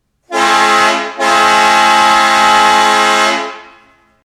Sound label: Marine Quadruplex, horn sounds